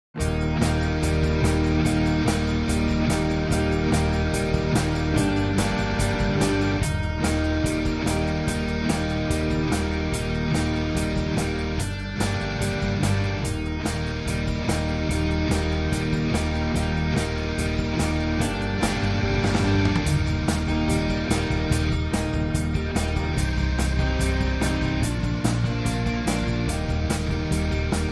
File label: Exciting Classic Rock